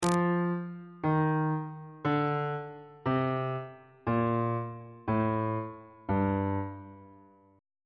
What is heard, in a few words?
f double sharp minor scale descending on bass clef